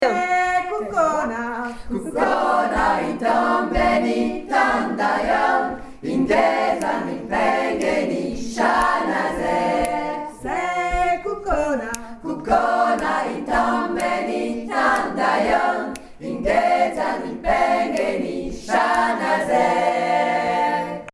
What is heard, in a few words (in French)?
Kukhona 1ere partie 3voix